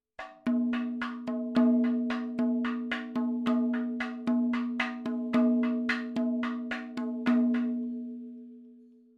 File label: 110 7 8 MADALAM, percussion; Sample Banks; MADHALAM; MADALAM 7 8 FILES